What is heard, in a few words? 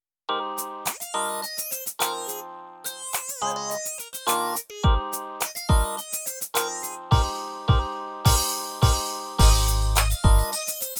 Groovin; YAM MO8